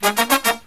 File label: Brass Riffs